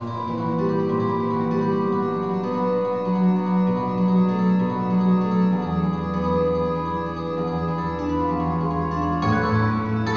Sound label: echo